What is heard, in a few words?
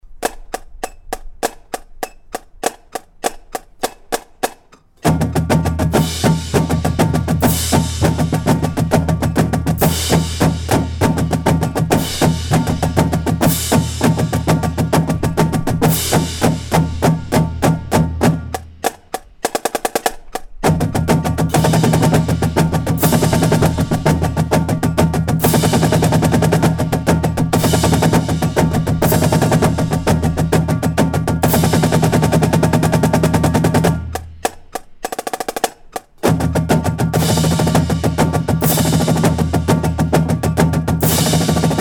Chuggada